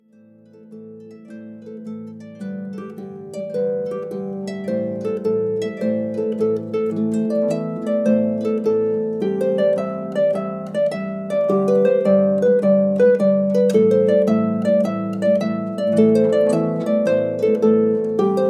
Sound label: Irish Traditional